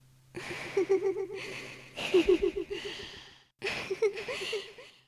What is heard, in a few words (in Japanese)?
warai ufufu